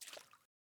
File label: water step; teardown; ps5 windows xbox series xs